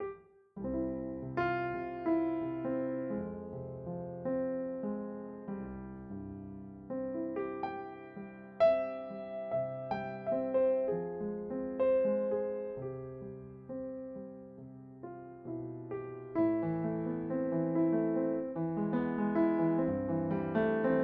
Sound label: Pianoteq; Improptu